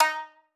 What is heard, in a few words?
marimba shamisen